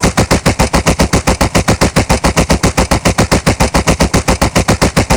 AGS17 L, Weapons; DCS

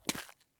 run asphalt; Escape from Tarkov

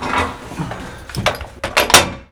Vehicle reloads; Red Orchestra Ostfront 41-45

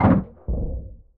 Aircrafts Gear End In; DCS

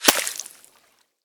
water; Escape from Tarkov

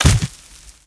connect dirt

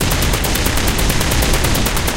loop oerlikon kda 35mm, guns tanks

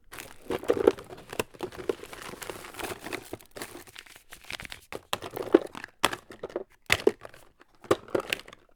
wood looting; Escape from Tarkov

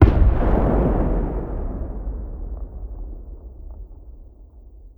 rocket explosion distant; Rust

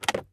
case use; Escape from Tarkov

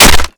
Projectile Sounds; Red Orchestra 41-45

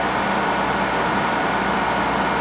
computer fan; Call of Duty Modern Warfare

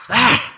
vce get up

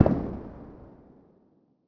landmine explosion distant; Rust

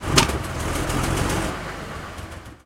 exterior engine switch, tanks; War Thunder

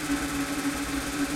cockpit movement loop; War Thunder

